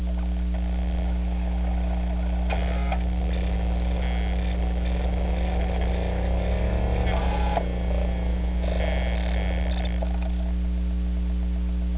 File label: fail